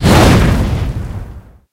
bongo

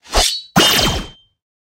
click lobby start

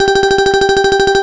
clear bonus rolling

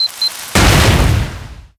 hit

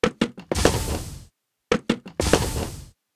shop gacha start

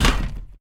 click metal

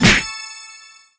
hit

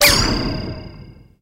guardian death

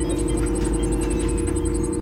loading loop; lab

